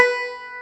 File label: BANJO B4, ETHNIC; AKAI S-Series CD-ROM Sound Library VOL-8